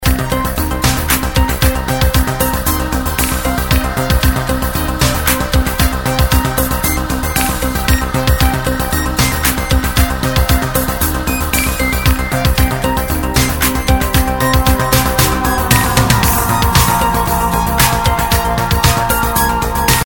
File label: arpegiator extract